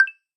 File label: chat join friend; keezers